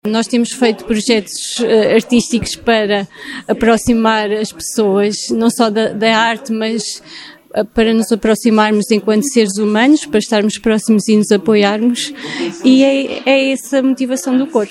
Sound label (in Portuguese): CORO